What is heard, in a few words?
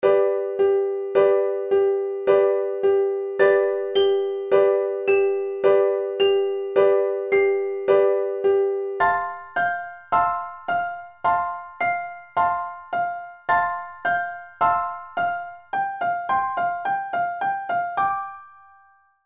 keys